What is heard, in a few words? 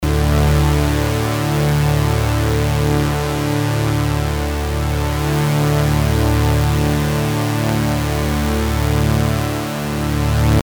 strings; reaktor